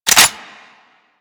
Dual Soma Clip Eject

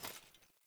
Grunt Foley Movement Armour; Characters; Enemy; Melee Grunt; Sinecure

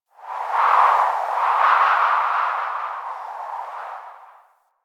Wind Gust, Ambience Plains

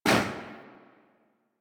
Tail Generic Interior Small, Weapons

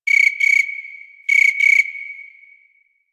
Night Grass Insect; Ambience; One Offs; Insects; Warframe; Quests; The New War; Drifter Camp

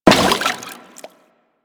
Crewman Step Water, Footsteps; Warframe; Corpus

